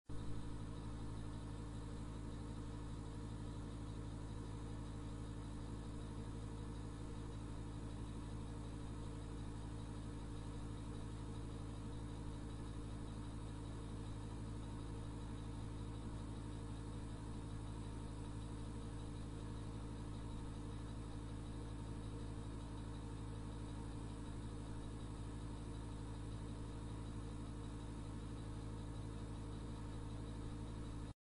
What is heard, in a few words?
Fan; هواکش